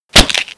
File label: Face Punch